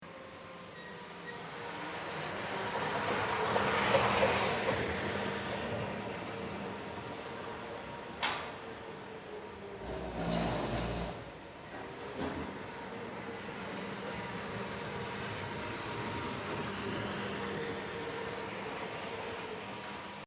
Reinigungsmaschine

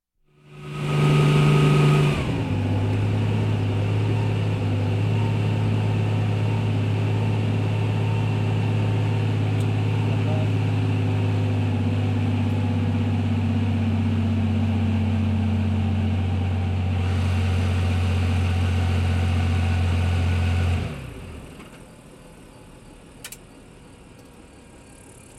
Luftwaffe Landender Hubschrauber an Bord

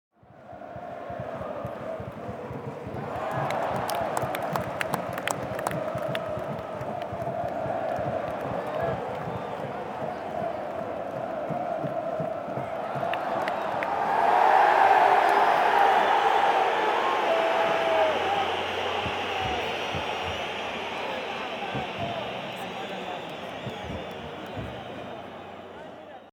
Stadion Fan und klatschen

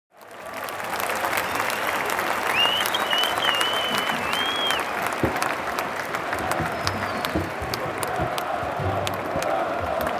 Stadion Atmosphaere

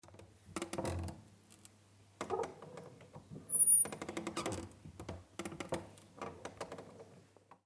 Schrank quietscht

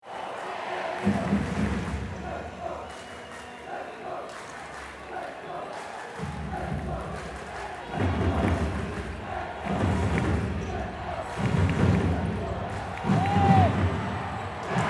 Handball Hallen Spiel Atmosphaere

